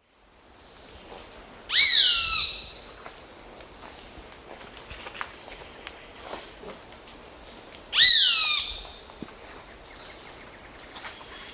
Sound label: Buteo magnirostris, calls; S. America; Eastern Lowlands